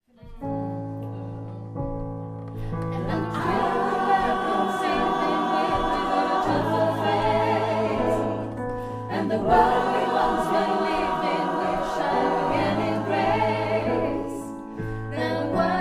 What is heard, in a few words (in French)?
pont nappe tous, gospel